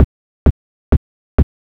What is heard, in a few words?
130 kick loop, 4 on the floor loop; Kicks